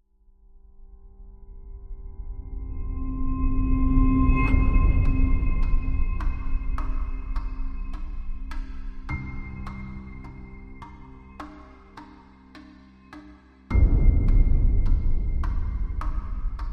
No Vocal Theme No Vocal Pad

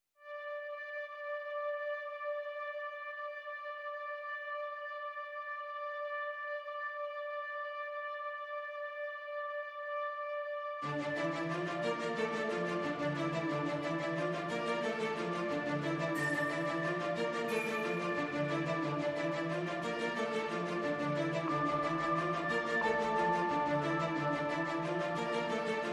No Drums and SFX

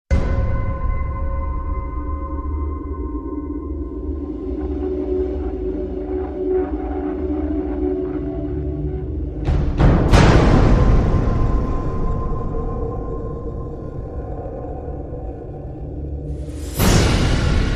Drums High Strung

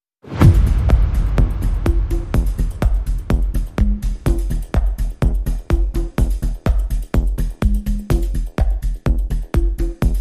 Rhythm Strings

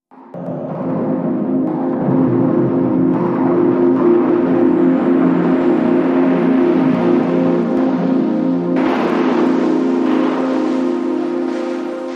Glitcher Sting

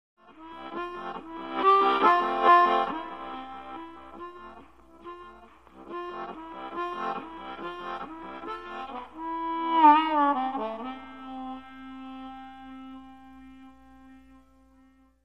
Harp

